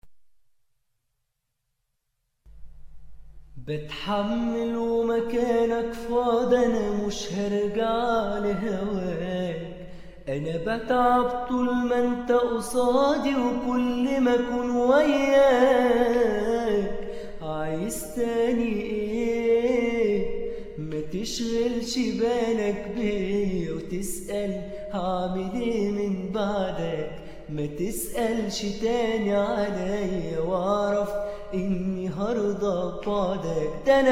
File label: solo